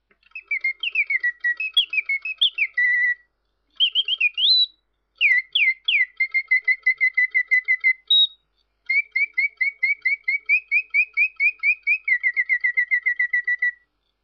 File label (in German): rechteck kaefig zwitschern; griesbaum